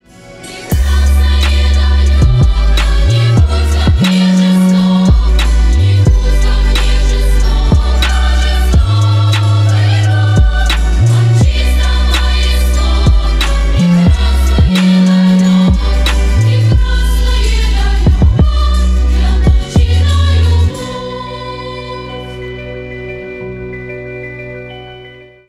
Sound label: trap remix